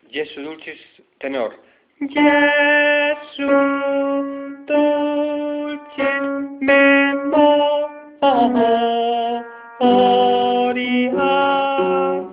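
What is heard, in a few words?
tenor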